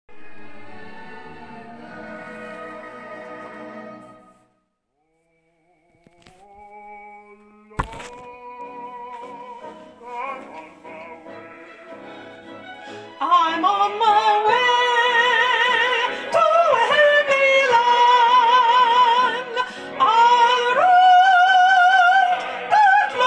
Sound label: sop 2